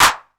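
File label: 808 clap; PERC TR; Wizoo - Platinum 24 Electronic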